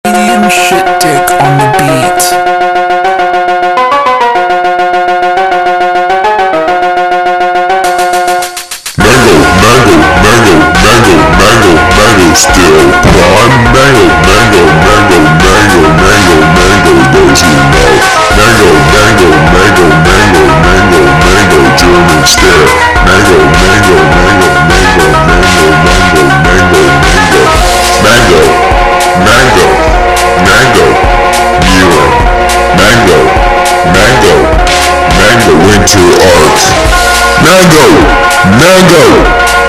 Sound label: Phonk slowed